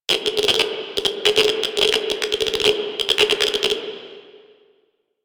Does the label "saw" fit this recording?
No